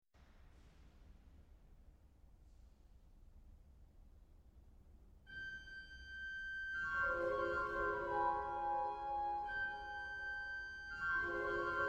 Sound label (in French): flutes, cavaille-coll